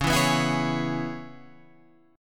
C sharp Minor 7th C sharp, chord; C#m7 Chord {9 7 9 9 9 9} strummed

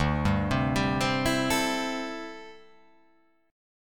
D 9th Suspended 4th D down; arpeggiated; JGuitar

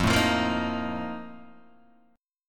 F 11th, Guitar, DADGAD

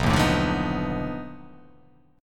B 7th Sharp 9th Flat 5th B down

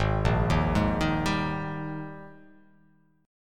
G sharp Major 7th Suspended 2nd; 4,1,1,1,3,2,x,x; JGuitar

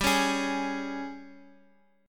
Ab Major 7th Suspended 4th G

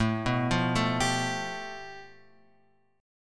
A 7th Suspended 2nd Sharp 5th; A7sus2#5 Chord {5 2 3 2 X 3} arpeggiated

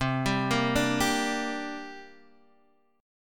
D 7th Suspended 4th Sharp 5th, chord

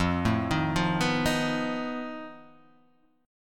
F 7th Flat 5th F down

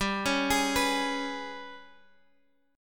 G sharp Minor Major 7th Double Flat 5th

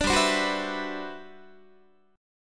G Major 13th, Guitar; Listen to GM13 strummed; GM13 Chord

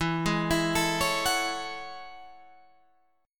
A Major 13th, guitar

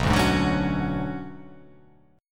B Minor 6th Add 9th B; Bm6add9 strummed; JGuitar

